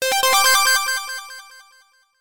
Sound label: ALERT